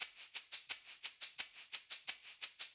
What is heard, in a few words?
shake, audiomix